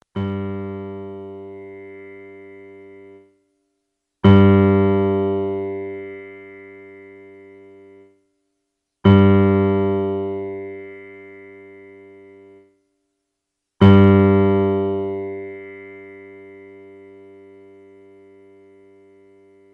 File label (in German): kontrabass g; wie kann ich meinen bass stimmen